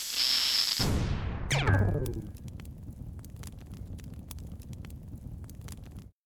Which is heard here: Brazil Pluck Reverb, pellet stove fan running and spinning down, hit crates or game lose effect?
game lose effect